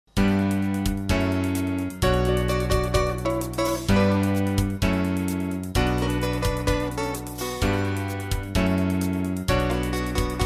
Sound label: guitar piano; ringtone